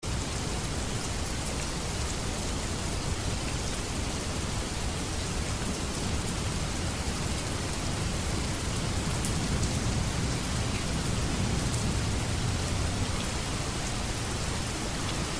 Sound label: rain scene; 雨天; 潮汐白噪音